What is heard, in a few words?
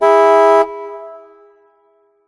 Music Frontend; kinect sports season two; xbox 360